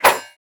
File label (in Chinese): 重攻击